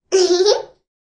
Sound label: A toddler giggles; Laughter; Voice